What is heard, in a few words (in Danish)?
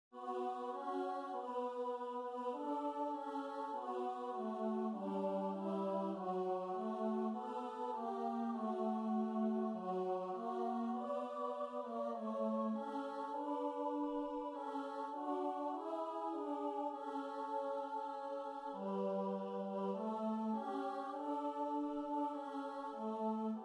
tenor; Der er ingenting i verden; korsats